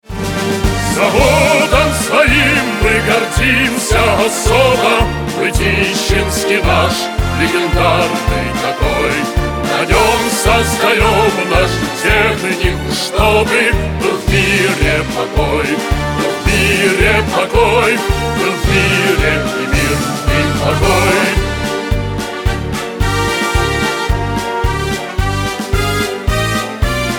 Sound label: ringtone long